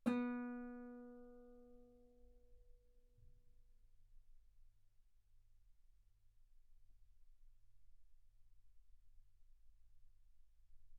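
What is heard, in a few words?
Guitar ff B string B3; sul B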